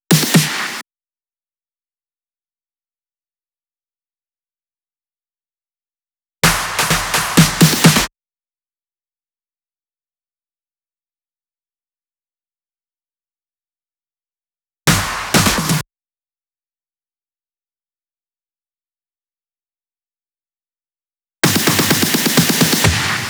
128BPM Fill Ins, Drums & Perc; Demo Constr Kits; Early Morning Kit Root G

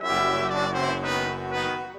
Brass; Sonic Foundry (Sony Creative Software); Orchestral VOL-2; Modern 35

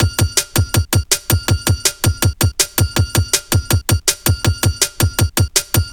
Bull Break 162; Zero-G Jungle Warfare 1, 2 & 3

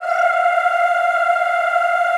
F4, Classical Choir; Best Service; Extended Classical Choir

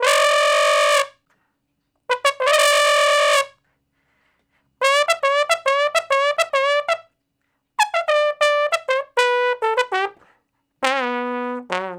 Trombone Straight (E), Horncraft for R&B Solos; Crimson Blue and Fabulous Horncraft 4 RnB

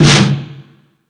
Percussion FX, E-MU Pro-Cussion

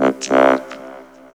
VOCOD, Tekno Industrial; East West

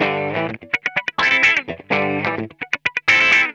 ROCKIN, Funk Guitar; 90 s Sample CDs; Zero G

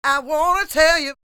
SING W, Vocal Planet Blues & Soul, BLUESY; ILIO

